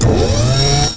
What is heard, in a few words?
HYDRAUL; Shadow